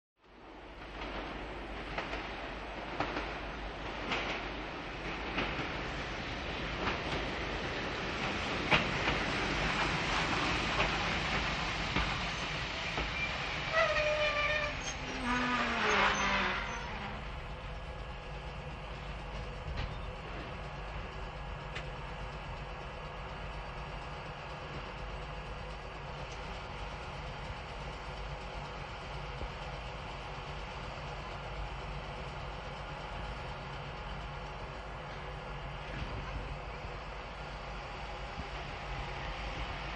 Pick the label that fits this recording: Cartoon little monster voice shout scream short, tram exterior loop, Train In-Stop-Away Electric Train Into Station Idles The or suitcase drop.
Train In-Stop-Away Electric Train Into Station Idles The